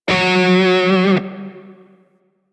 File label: Grineer Shawzin Flourish Oct Two F Sharp; Music; DE; Warframe